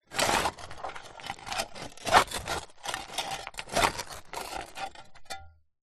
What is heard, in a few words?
industrial shovel metal dig movements dirt large stones